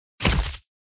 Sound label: Thunder element